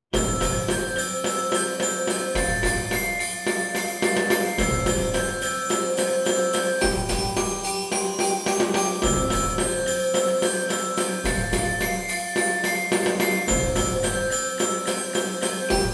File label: Percussion Ensemble